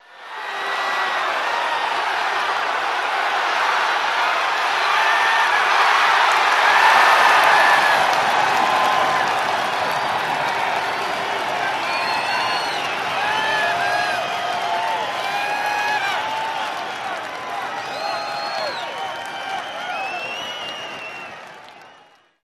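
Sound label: Crowd Cheer Touchdown, football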